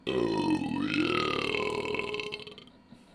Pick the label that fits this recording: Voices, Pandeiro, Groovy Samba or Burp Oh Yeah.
Burp Oh Yeah